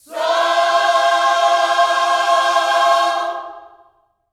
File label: SOUL, Vocal Planet Gospel